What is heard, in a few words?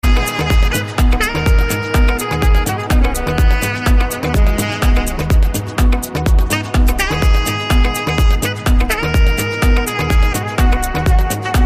big love sax